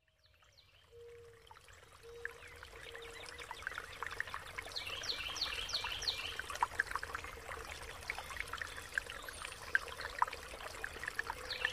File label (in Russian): zvuki prirody ruchej penie ptic zvuki prirody